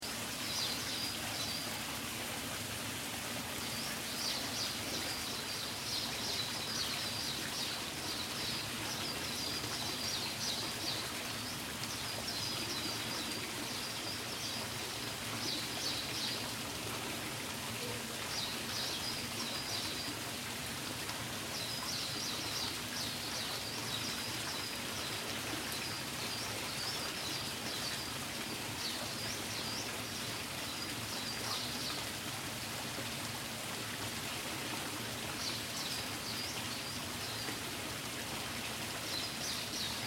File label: Water Feature Garden; A garden surrounded by palms and trees, with two water features / fountains. Light birdsong.; Water Sound Effects; Pleasant garden a